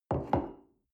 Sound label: coffee place table soft